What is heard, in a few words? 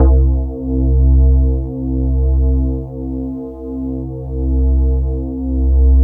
E-HARP, DX HARP; Wizoo - Powered DX